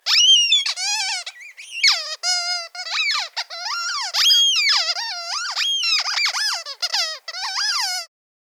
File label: Cartoon Rubber Duck; SFXSource; Cartoon & Toy SFX